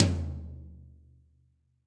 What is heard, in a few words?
Tom Floor Bright Room; Drums; Drum Hits; Ableton; Factory Packs; Session Drums Studio; MPE Hits